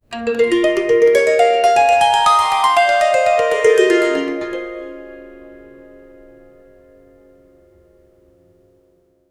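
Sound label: fast ascending harp arpeggio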